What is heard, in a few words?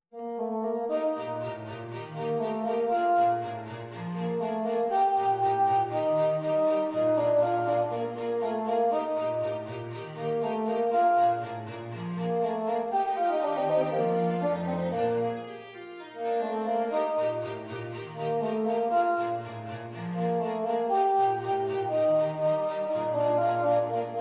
Quartet